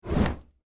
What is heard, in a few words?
Lava Awake; my cute commissar 2020 gamerip